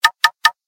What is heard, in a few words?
Apple Knock